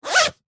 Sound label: foley luggage rucksack backpack zipper fast; zapsplat